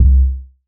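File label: CLUB bass drum, CLUB KIT; Wizoo - Platinum 24 Electronic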